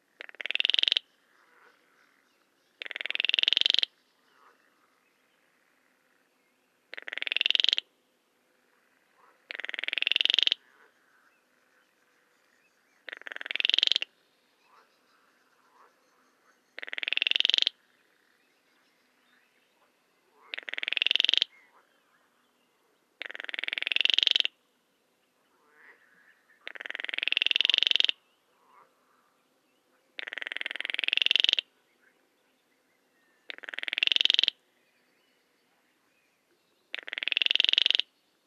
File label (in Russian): pogonysh kroshka golos